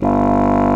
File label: BASSOON G1, REEDS; Greytsounds VOL-1